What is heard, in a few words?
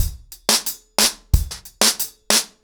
ACOUSTIC DRUMS, 90 BPM